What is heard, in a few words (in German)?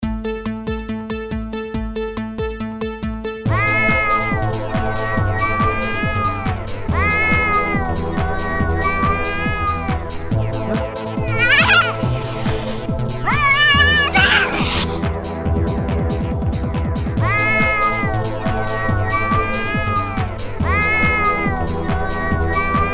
katzengesang